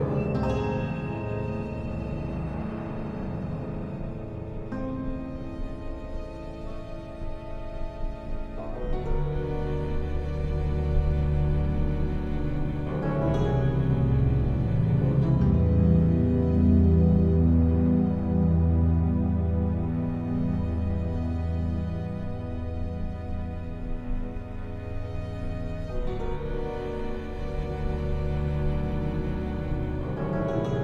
Eerie loop; the walking dead michonne 3 what we deserve

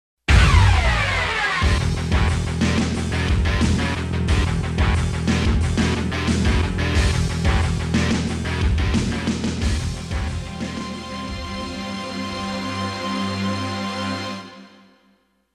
KO Win Advancement MUSIC; 3rd Match KO - Final Tournament; Buriki One World Grapple Tournament '99 in Tokyo

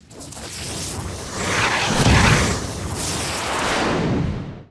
Fly Cobra; pangya fantasy golf pc

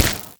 run stone

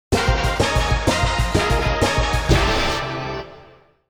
LOSE; magical drop; dunk dream 95

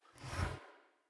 month window

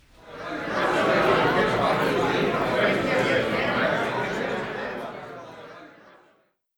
crowd medium outro no applause

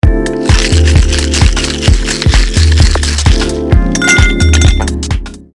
Bar Ambiance; hotel giant ds; nds

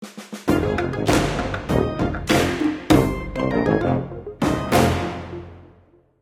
Level failed

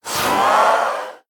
Ghost Pain; The Town

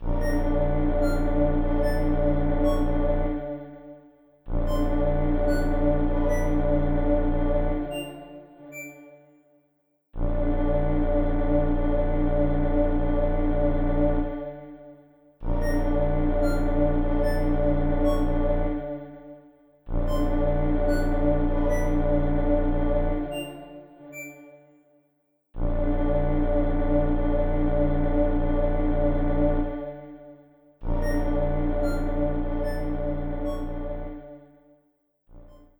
Save Room-Item Room (Mono); Metroid Zero Mission Remastered

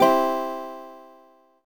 Guitar Strum; the legend of zelda phantom hourglass gamerip; Freedle

